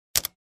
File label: Clicky Button press